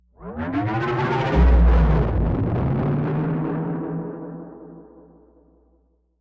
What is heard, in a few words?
sting; minecraft story mode gamerip 2016; A Portal To Mystery; Echobang